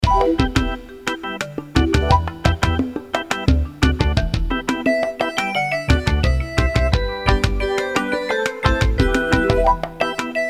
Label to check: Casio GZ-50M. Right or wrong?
Right